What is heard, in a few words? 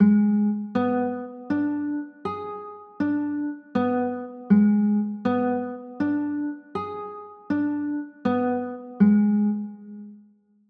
a flat dim, chords